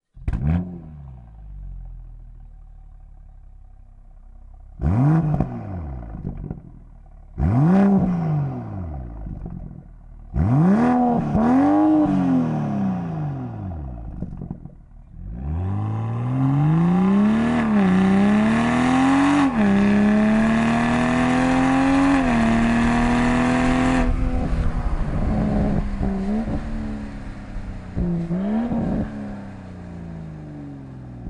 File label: TITANIUM BMW M440i G22; EVOLUTION LINE